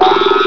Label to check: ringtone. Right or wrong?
Wrong